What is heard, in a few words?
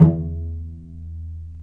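strings cello pizzicato, dry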